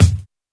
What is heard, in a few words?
Kick Boom Bap